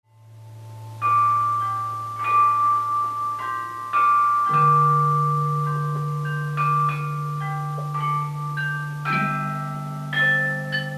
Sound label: Bell solo